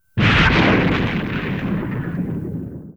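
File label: explosion; TOAW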